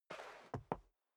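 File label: step dress boots concrete scuff, footsteps; schritte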